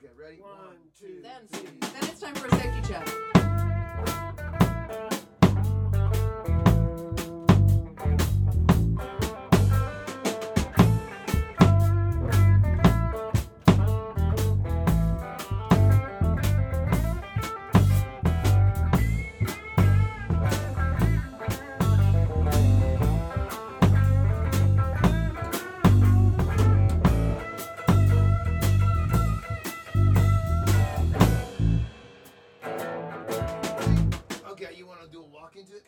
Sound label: Breakdown gtr